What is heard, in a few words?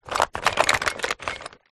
household makeup in pouch rummage; zapsplat